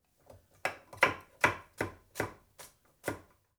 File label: Chop Carrots; MinorHallutinationsSounds; SFX Producer